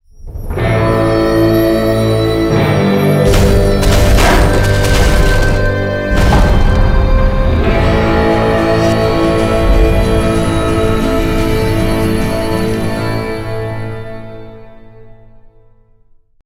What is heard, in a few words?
Lottery Win Jackpot; Decent